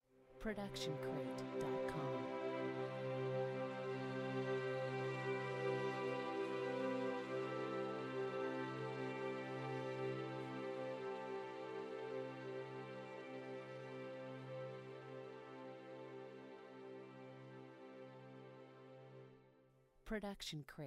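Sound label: optimistic long strings; soundscrate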